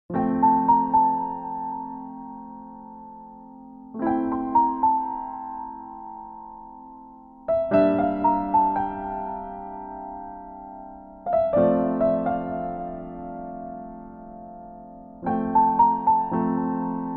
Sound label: Piano Slow; instrumental ringtones